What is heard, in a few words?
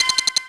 ghost lap